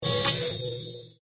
electro drone; Danger Mouse - Ultimate

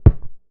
ball kick pass; Cartoon Network - Toon Cup 2020

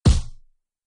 hit; Power Rangers vs Teenage Mutant Ninja Turtles - Ultimate Hero Clash 2

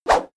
punch attack; Henry Danger - Day Of Danger!

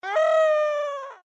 scream; Adventure Time - Elemental; candy

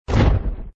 boom; Ben 10 - Hero Time Game; ThrillGames

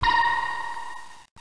start; Zombie Smasher

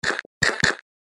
plant bite; Danger Mouse - Ultimate

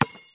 basketball floor; Looney Tunes - Recess

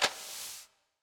door open; Ben 10 - Hero Time Game; ThrillGames

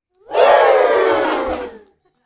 game win; Oddbods - Samebods

